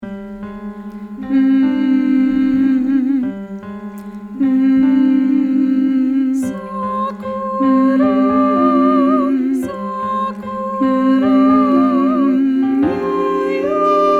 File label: Sakura Sakura Tenor